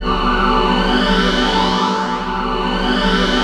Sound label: STRNG SPACE